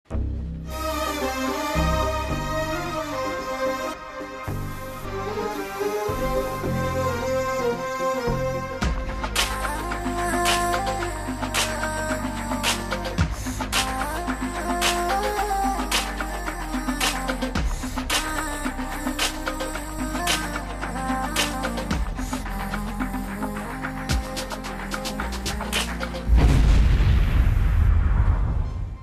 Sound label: love bgm; sarvam